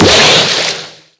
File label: Shoot; ThumpBack